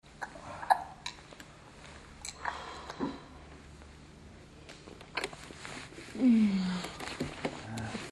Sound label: suck, moans; The Witcher Hunt